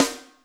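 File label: Snare Ambient Hi Piccolo, Alesis D4 drum machine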